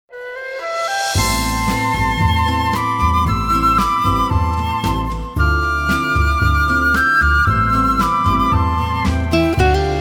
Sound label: Rainy, Nhạc Thư Giãn